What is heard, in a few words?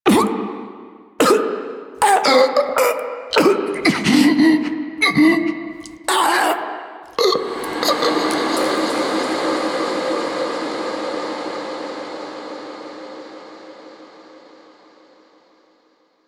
Choke Death; Ballas; Warframe; The New War; Finale